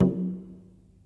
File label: 70s Low Mid Tom Drum One Shot F# Key